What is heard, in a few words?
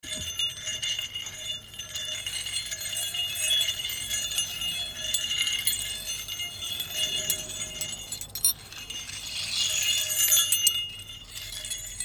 metal clang musical; filmscore